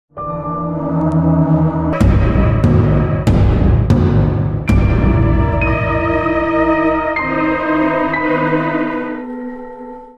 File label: Cinematic Music